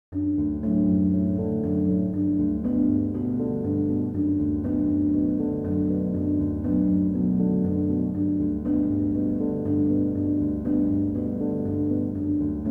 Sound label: Ambient Piano